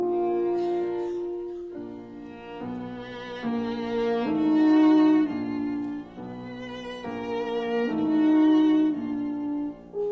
Trio for Violin Horn and Piano in E flat major Andante; Brahms; Op 40